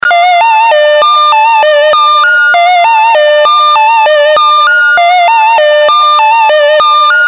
gated LFO tri, modular

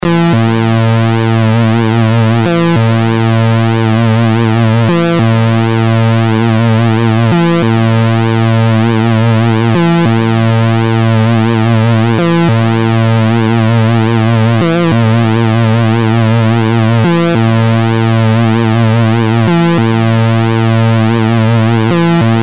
delayed LFO tri, modular

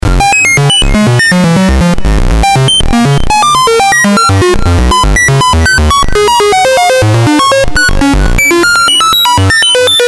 SH noise, modular

